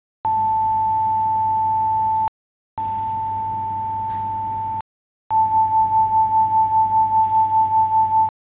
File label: 880 885 and beats